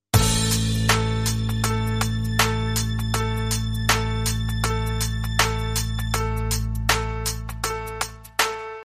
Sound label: Hip A Soul Saxophone